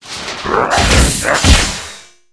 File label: dark phalanx attack, monster